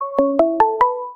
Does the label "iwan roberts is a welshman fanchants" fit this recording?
No